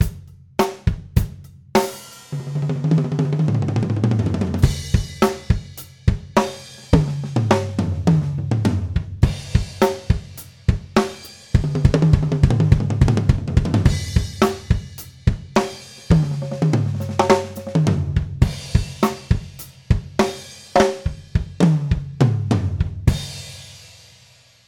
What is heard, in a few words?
Toms, drum; drum shootout